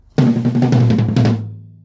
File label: Toms